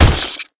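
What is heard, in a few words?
darksha damage up, monster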